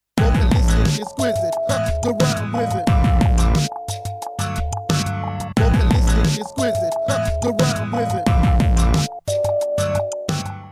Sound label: Electribe SX